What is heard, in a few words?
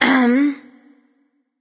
clear throat; smite; aphrodite